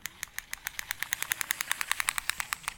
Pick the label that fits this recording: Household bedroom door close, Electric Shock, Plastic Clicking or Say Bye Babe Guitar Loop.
Plastic Clicking